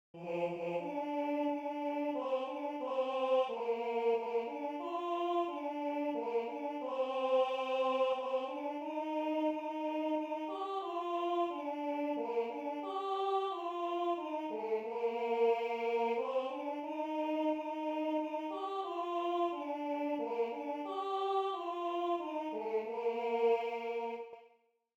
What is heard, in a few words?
Tenor I; Kemmts leie einer in die Stubn